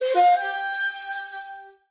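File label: Skill background music; Naruto Ultimate Storm; android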